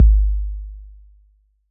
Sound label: BD 808 Decay